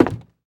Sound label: wood, player footsteps